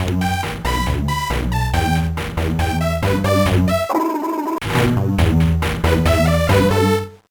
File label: Bathroom Jam